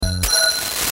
Glitch Beep To Distortion Whoosh, Glitch Hard Static Stutter; glitches; Blastwave